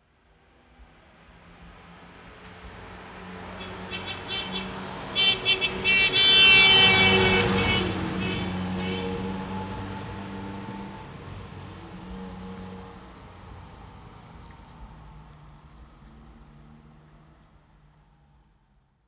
Fiat Car Indian Exterior Pass by at fast speed Doppler effect Horn Honk; Auto; The AudioVille